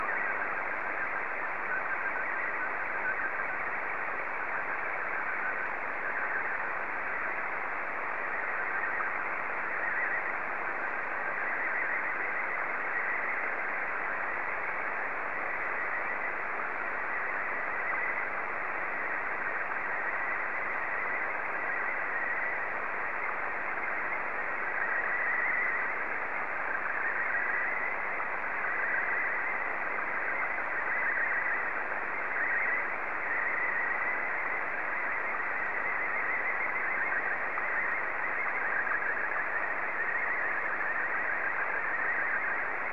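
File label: SSTV, Martin 1; KJ4QVJ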